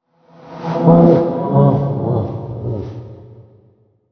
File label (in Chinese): 诡异森林氛围声; ambient